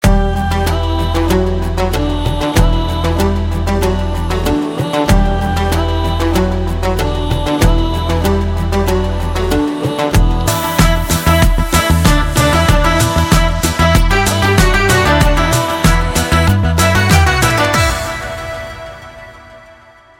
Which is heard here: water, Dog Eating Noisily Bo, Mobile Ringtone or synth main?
Mobile Ringtone